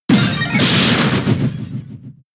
Spit Up; Pokemon; gen 7; SUMO; USUM

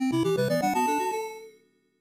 Unknown Jingle; bomberman b daman; snes

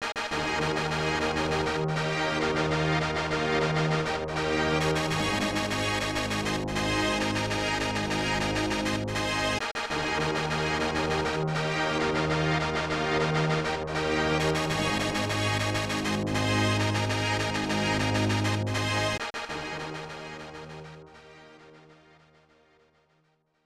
All Clear; power rangers zeo battle racers snes

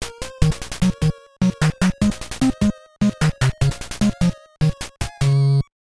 Game Won

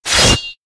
Fishmen Sword Attack Hit; we are the dwarves gamerip 2016

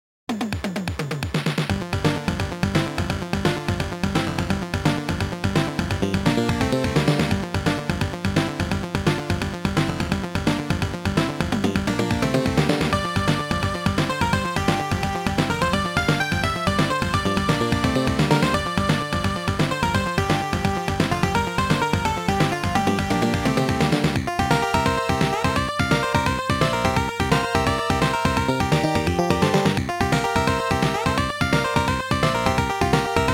Stage 3 BGM; super star soldier; Dislapidated Zone

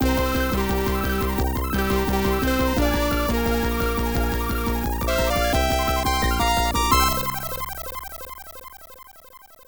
Win Out

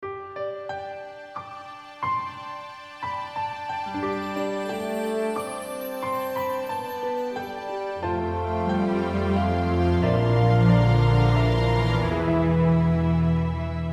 Jingle Positive; Luka und das geheimnisvolle Silberpferd

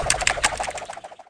Game Start; SFX Game Admit Start

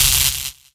Comb Thunder

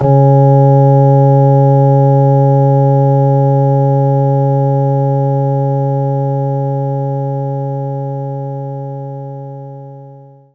Hammond Organ; knights try

